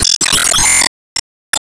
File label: Enemy Bigbumpo Active Hit; Enemy Boss Common Strongattack Hit; gamerip